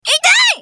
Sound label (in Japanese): ダウン; エンジ